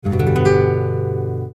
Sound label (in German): Der G-Dur-Akkord, G-Dur g-h-d; Grundakkord (Tonika); Harmonielehre